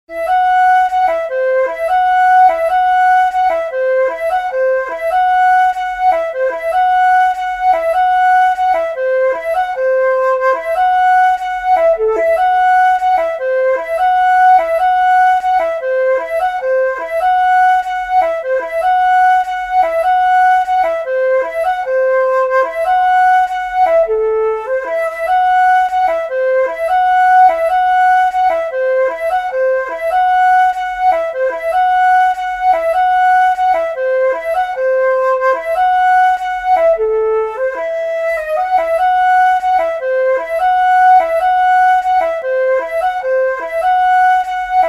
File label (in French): mélodie